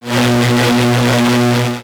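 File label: Laser Fire Interior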